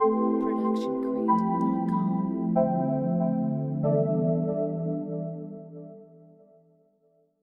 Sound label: ambient logo; SoundsCrate